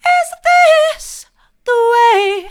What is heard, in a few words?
mid, vocal